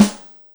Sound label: Maple, Yamaha DTXpress Acoustic Snare